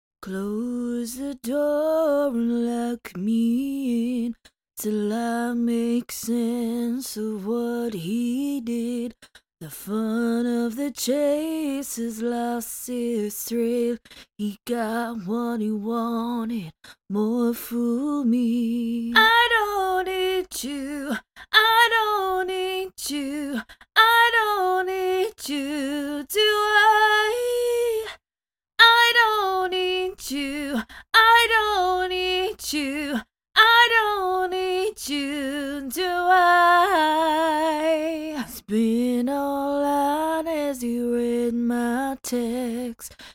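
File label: pop country 100 E minor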